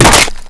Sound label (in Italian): bullet vs legno